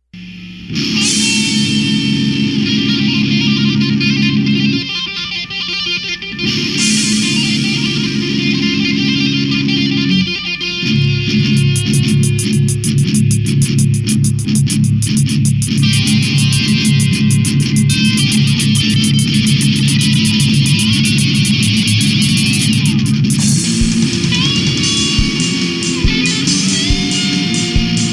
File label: guitar